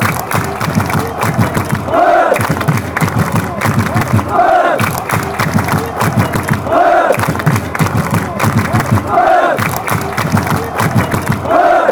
allez, fanchants; paillade

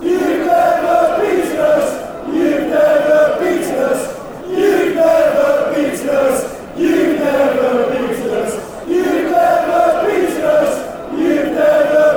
youve never beaten us

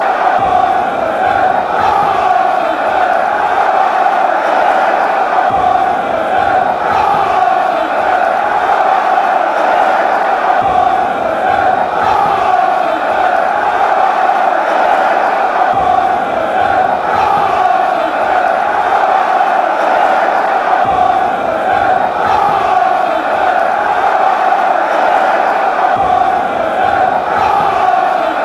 come, chants; twente

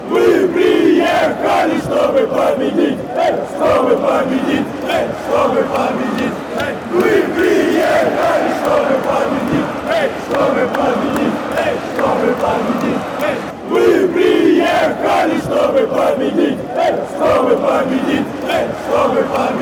russian chant

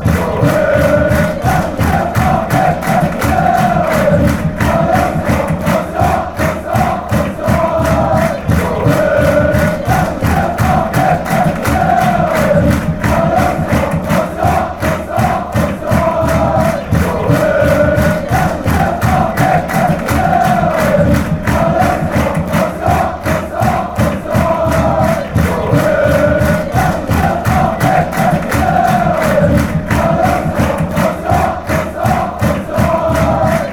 ale allez, fan chants; auxerre

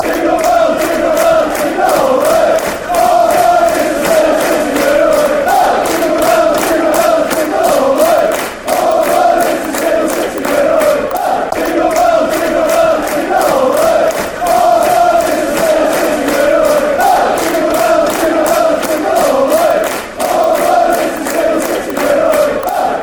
jingle bells

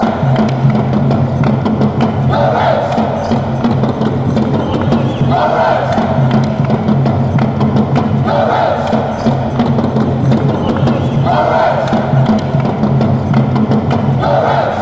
fan chants; go revs

